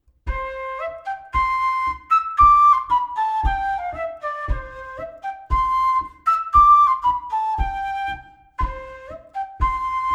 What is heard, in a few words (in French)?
Bourree variante; Rec Chateau Bertrand; Bourree N°2